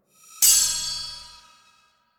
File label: Nursery SFX Sword Tap Frame; The War Within; Teshin